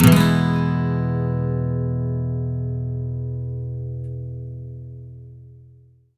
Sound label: MAJ SWEEP E, Electric & Acoustic Guitar Loops; SWEEPS; USB Soundscan vol.04; AKAI